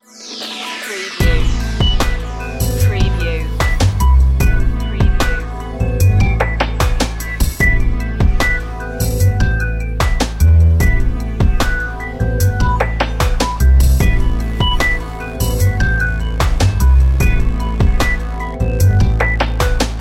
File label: ambient skies